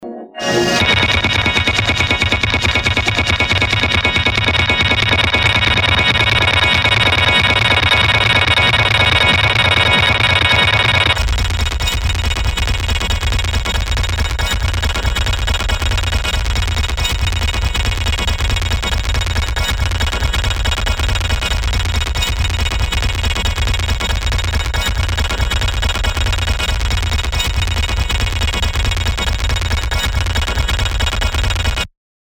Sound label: pink noise